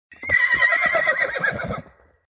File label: Down horse